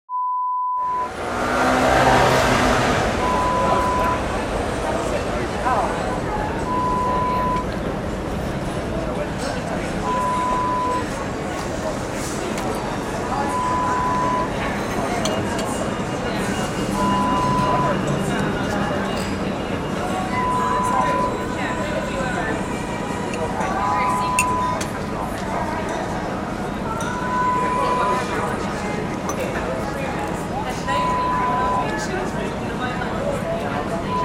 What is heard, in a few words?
Cafe sound